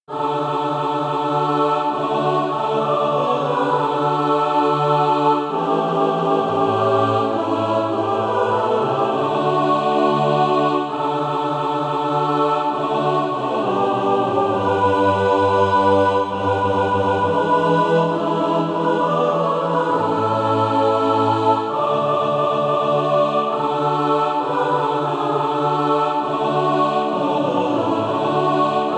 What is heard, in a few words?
mixed; SATB